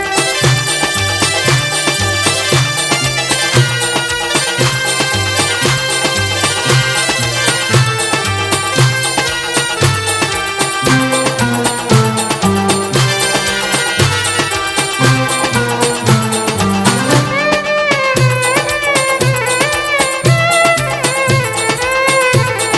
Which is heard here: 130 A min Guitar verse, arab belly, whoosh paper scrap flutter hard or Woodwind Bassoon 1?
arab belly